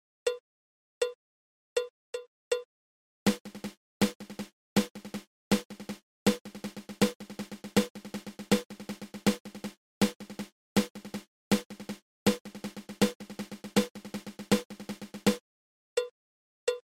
Single Ratamacue

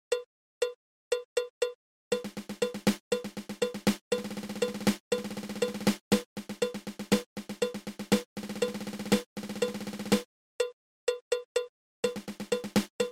Thirteen Stroke Roll

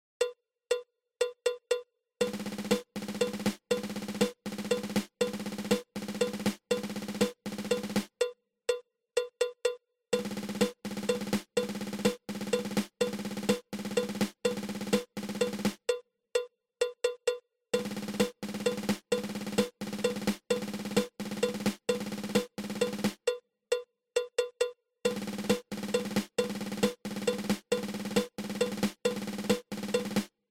Nine Stroke Roll

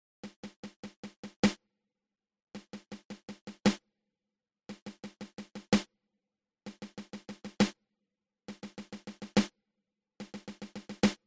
Seven Stroke Roll Triple open close open

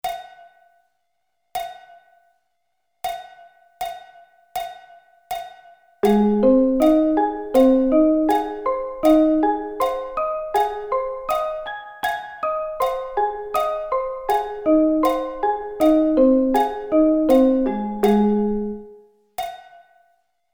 Ab Arpeggio Inversions

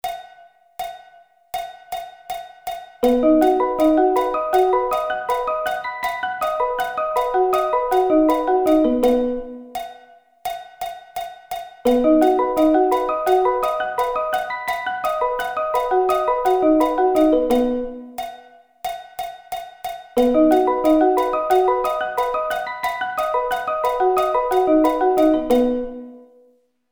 B Arpeggio Inversions

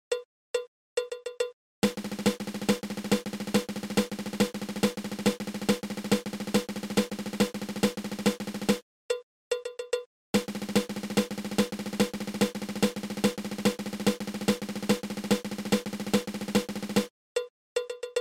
Five Stroke Roll Triple

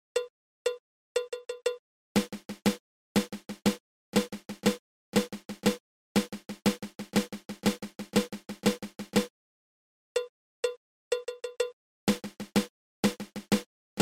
Flam Accent

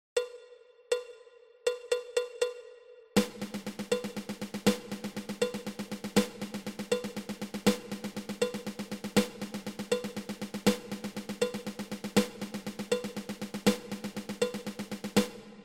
Eleven Stroke Roll

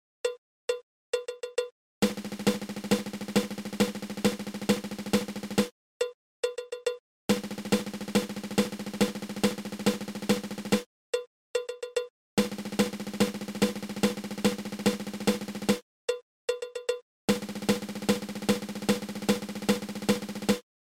Paradiddle diddle

